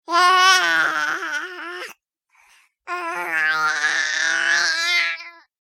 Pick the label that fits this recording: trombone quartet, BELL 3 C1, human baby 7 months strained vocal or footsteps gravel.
human baby 7 months strained vocal